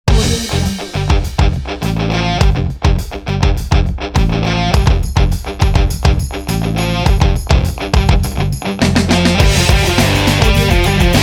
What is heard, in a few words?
f1 grand prix; muzaproduction